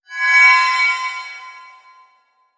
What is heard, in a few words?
Elec Magic; Legend of Keepers (gamerip) (2019); Sorcerer Attack Elec Magic1